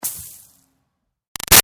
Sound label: Snow Dust Drop Light; Weather; Big Room Sound